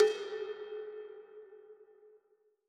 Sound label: percussion, percusson samples; RockScience